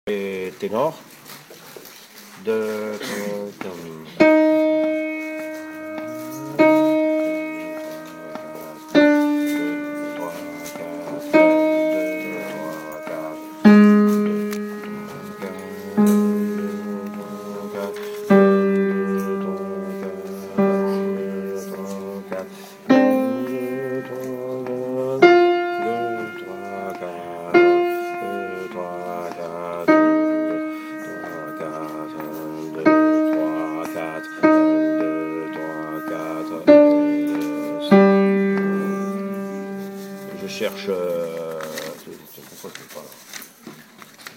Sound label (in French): tenor